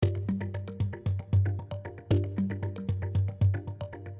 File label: Mallimban Gm7 115 Choppy